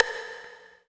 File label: Percussion